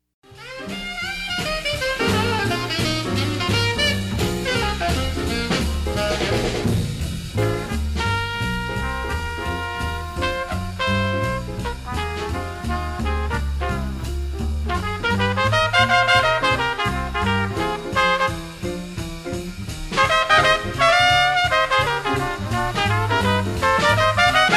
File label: Work Song Duet Part Added; TRUMPET